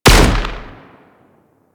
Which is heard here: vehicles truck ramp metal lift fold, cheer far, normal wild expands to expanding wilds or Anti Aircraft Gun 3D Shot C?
Anti Aircraft Gun 3D Shot C